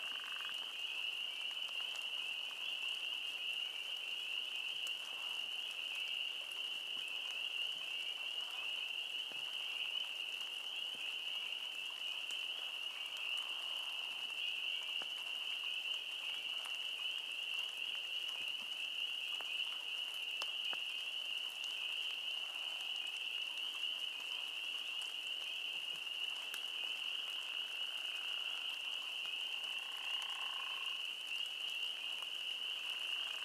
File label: forest ambiance chepachet spring night; markb